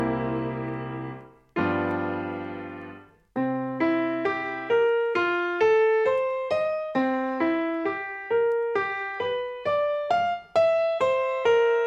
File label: Piano